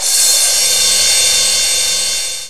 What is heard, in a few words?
mallet; Sounds Terrific II; Weird Science; Amiga